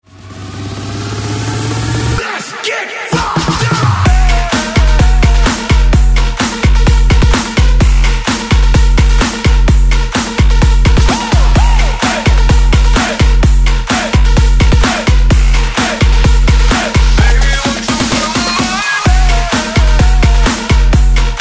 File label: 128 break mix smasher; DJDOLLS